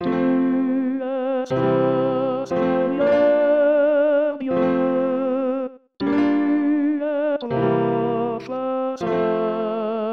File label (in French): Tu Es Saint Seigneur Dieu Tenor Accords; Chorale